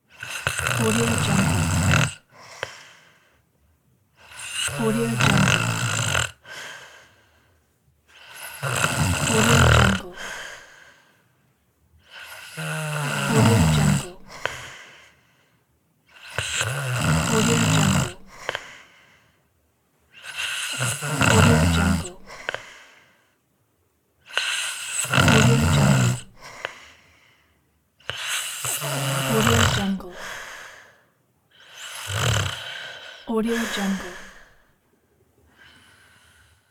snoring

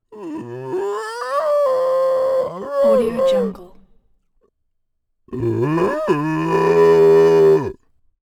subterranean beast humanoid or creature screams

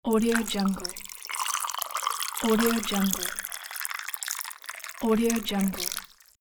pour water into a glass with ice cubes; kaelv

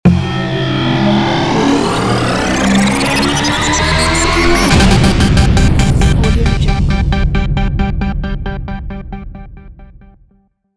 futuristic logo

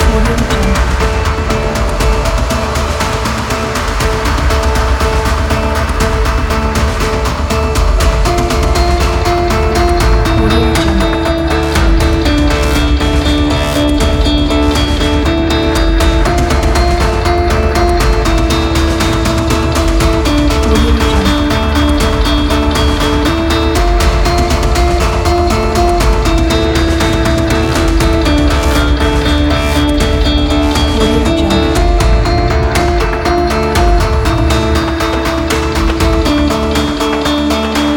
tension

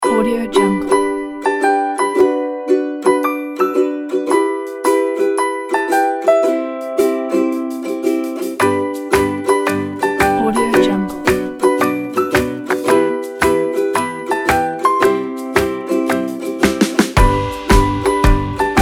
a cheerful day kit

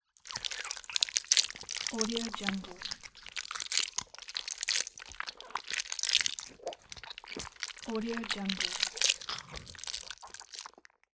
handling intestines entrails

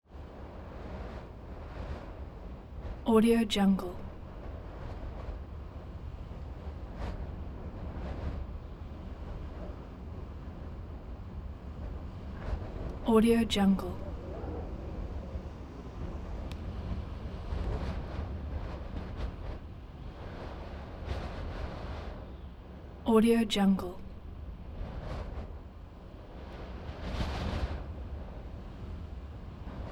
wind constant interior house distant hiss

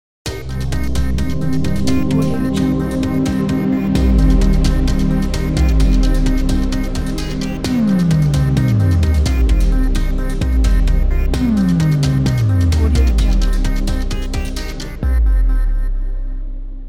spatial voice logo

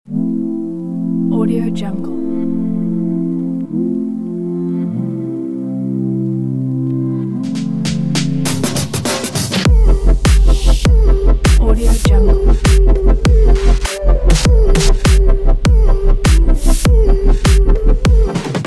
motivational future bass

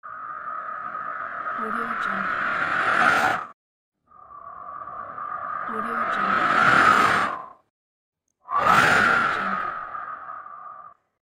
alien star ship

